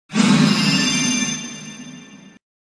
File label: woosh